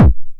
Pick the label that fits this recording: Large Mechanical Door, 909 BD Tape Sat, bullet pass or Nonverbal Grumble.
909 BD Tape Sat